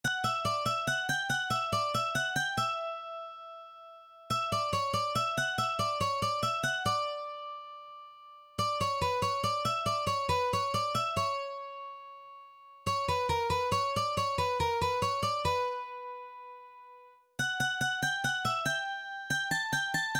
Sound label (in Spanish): Canto de entrada, Rondalla; misa rociera